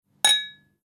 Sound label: bip